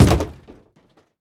Crash Objects Plastic

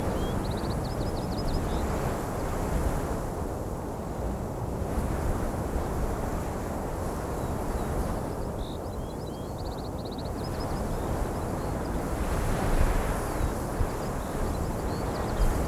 BGM Start; the initial

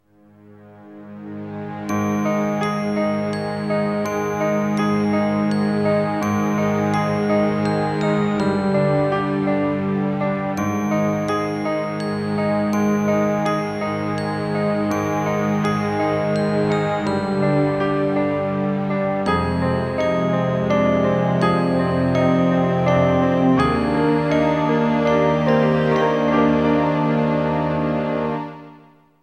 Howl Song; the legend of zelda twilight princess

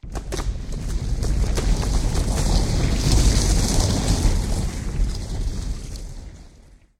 Rumble OneShot

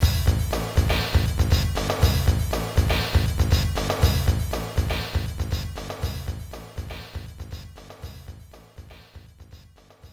Bonus Game BGM; Quiz King of Fighters

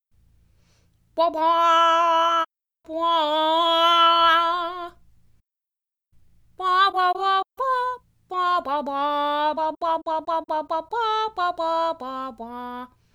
Alto Solo; Later Alligator; Lator Gator

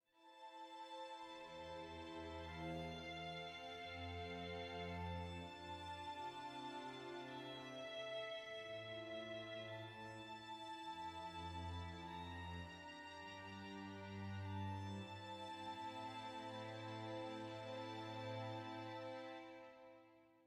adventure soft

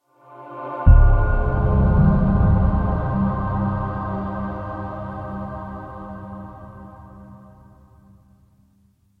Open Menu; Ghost of Memories

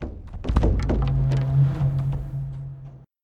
Tribals Idle Soft; Chapter3 Glacier

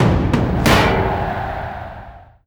Win; Spartan

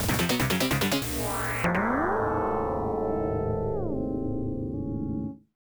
Appear

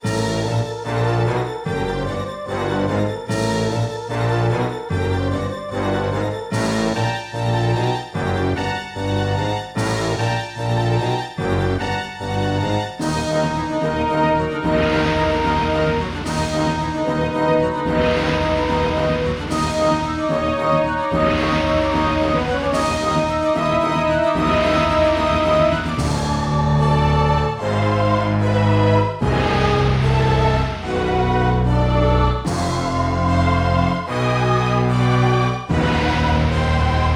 Distortion; Ogre Battle 64 Person of Lordly Caliber; N64

